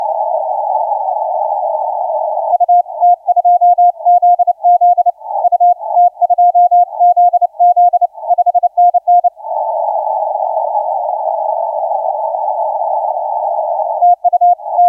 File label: 30m